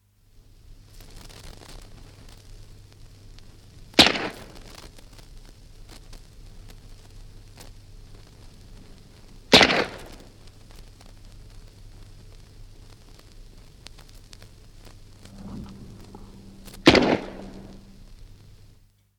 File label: Two single shots of a Bren gun (from 78 disc); Machine Guns; BBC